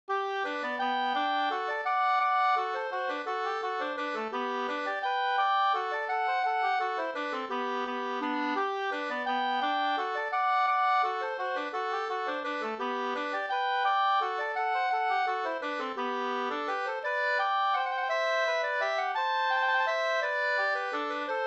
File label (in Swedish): vals